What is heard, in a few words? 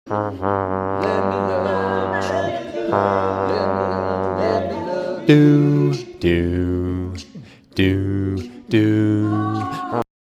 trombone